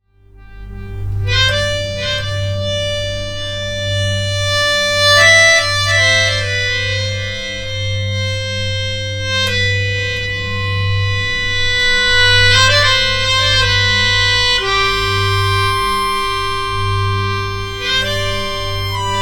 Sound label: Prelude